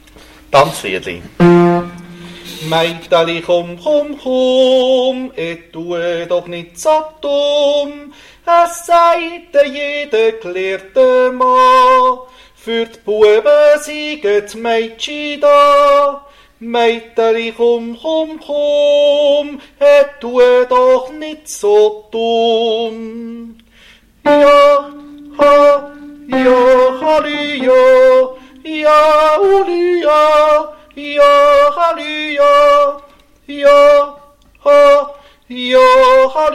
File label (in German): Tenor